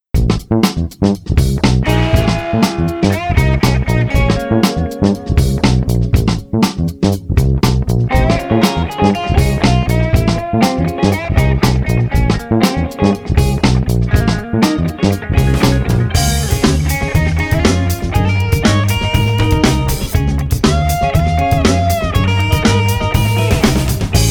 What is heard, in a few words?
Blues Funky Jam 120